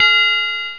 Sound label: Chime